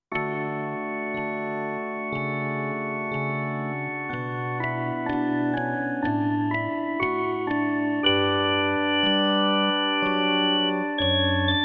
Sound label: Slow Rotary